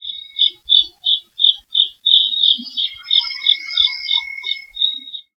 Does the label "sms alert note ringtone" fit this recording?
No